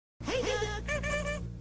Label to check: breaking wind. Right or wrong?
Wrong